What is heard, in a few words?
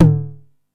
TOM M, 909; musicradar-synth-samples; Classic drum machines; 909TOMS